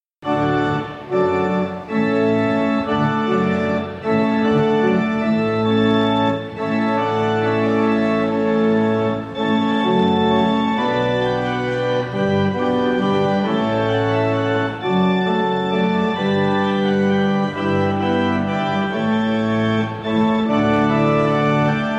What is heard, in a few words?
Hornpipe, organists